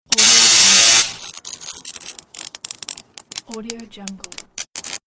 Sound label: cutting metal